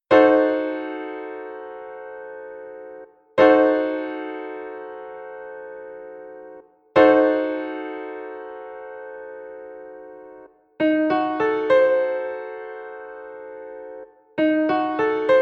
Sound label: Disdim7 Piano Chord